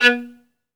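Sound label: SOLO VIOLIN, Synclavier Strings; ILIO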